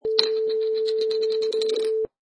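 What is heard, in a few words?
Plastic Bottle Cap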